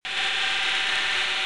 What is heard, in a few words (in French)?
sous marin bruit